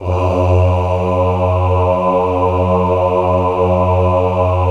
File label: BAH G 1, Synclavier Strings; ILIO